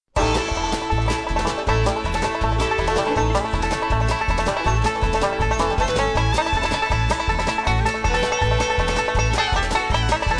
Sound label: fiddle